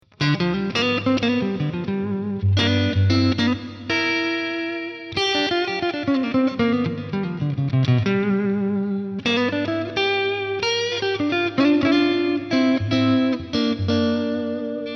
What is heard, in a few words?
solo